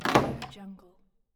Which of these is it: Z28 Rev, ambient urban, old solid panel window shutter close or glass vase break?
old solid panel window shutter close